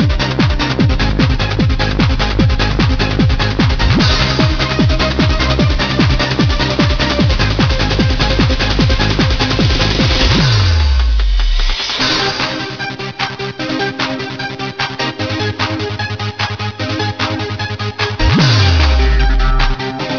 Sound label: atomic dance